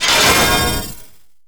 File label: Pickup Armour; SFX Kit; Pickups